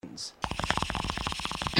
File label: Bubbler